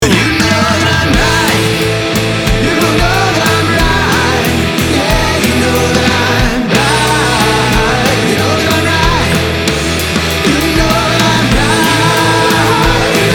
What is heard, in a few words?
Rock Mix Bus